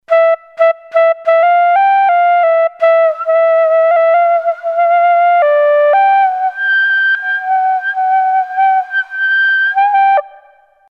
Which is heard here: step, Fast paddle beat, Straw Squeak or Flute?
Flute